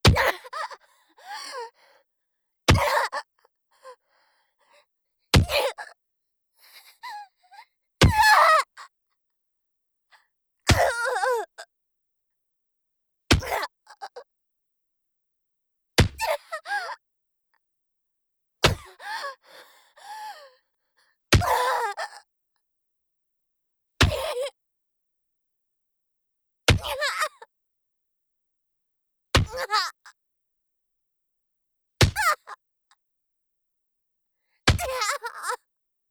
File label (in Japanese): フック BPM90 ワン; 効果音あり